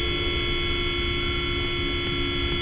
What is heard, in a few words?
starter loop; fgdata